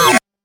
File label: new area, game events; freepixel